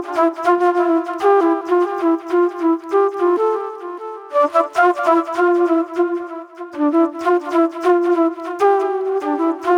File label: Acid Trip Flute; Sonic Foundry (Sony Creative Software); Manhattan Flutes; Smooth Groove